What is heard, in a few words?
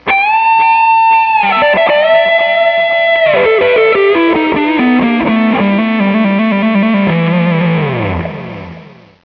guitar; svencoop